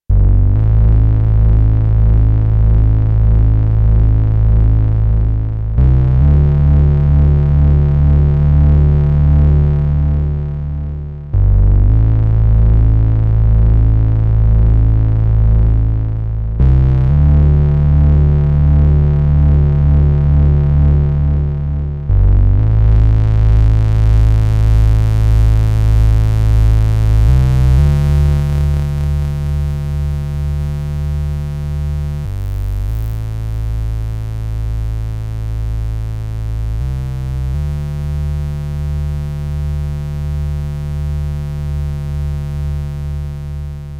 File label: Vermona; Vermona 14